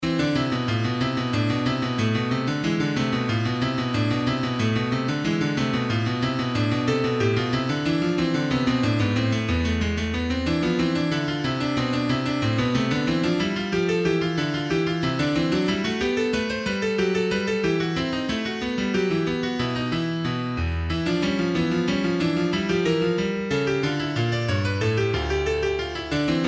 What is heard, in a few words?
bach english suite 1 bourree 2